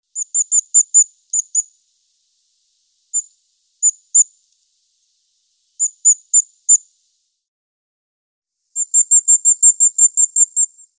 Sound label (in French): Cris du Roitelet triple-bandeau, Hauts, assez clairs, flûtés; ATLAS NICHEURS BRETONS 2004-2008